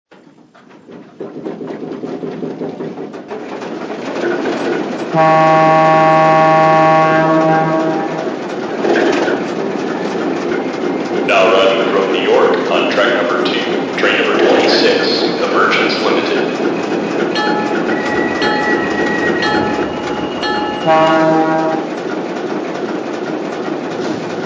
Alco PA

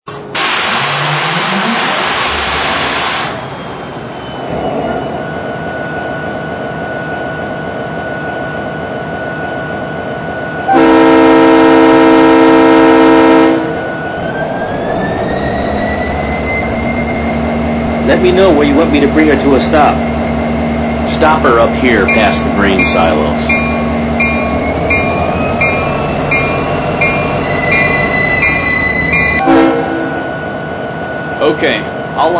EMD GP60M

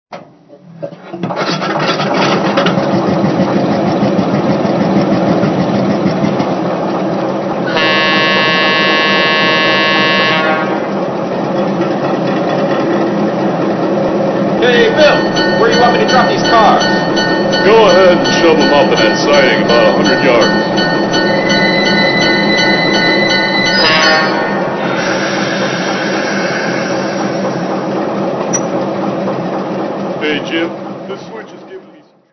GE 44Ton

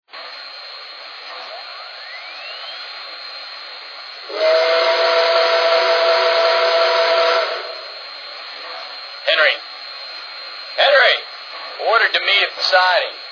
Berk, trains

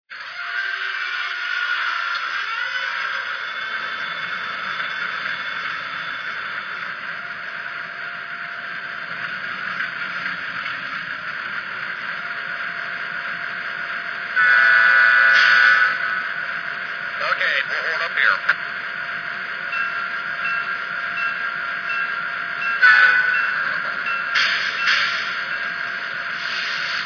U30C, trains

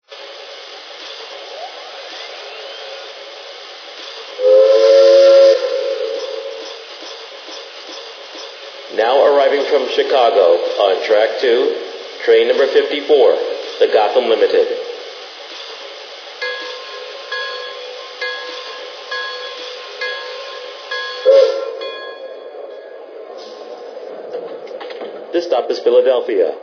m1a

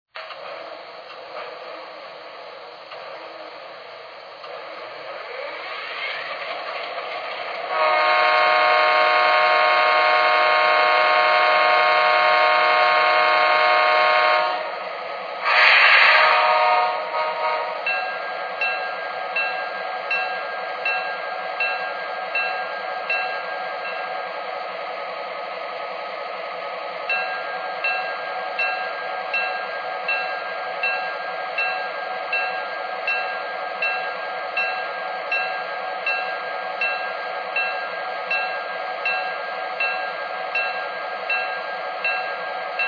gp38 2

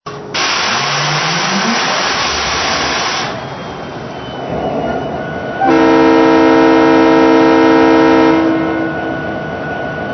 EMD SD60

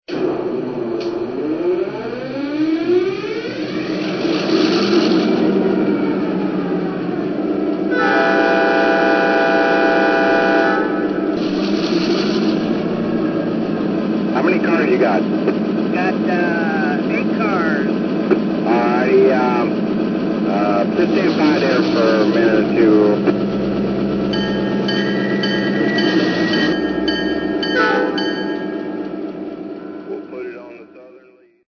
GP38-2

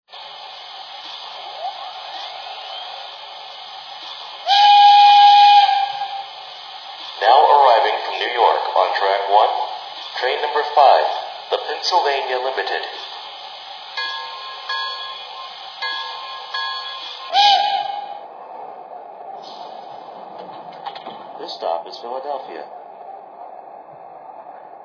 ten wheel

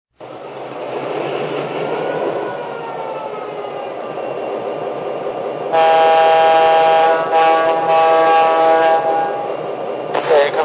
F3 ABA freight, trains

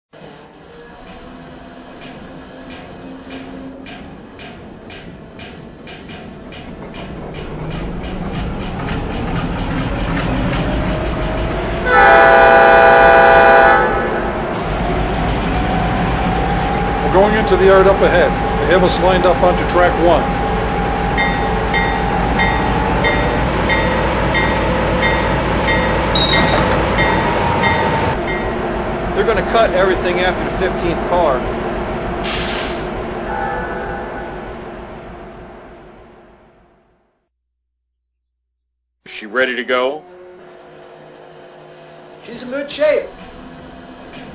GE U25B

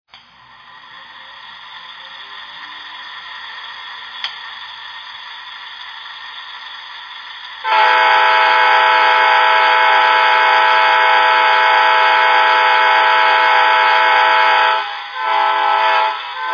aem7, trains; amt

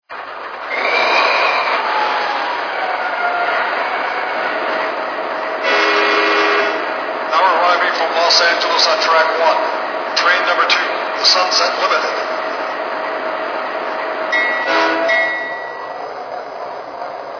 GENESIS

